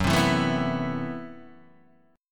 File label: A Minor 6th Add 9th Gb, Chord